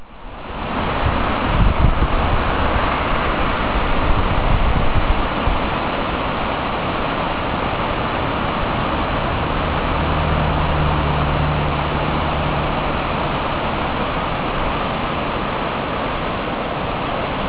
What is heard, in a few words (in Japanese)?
TONEGAWA NO OTO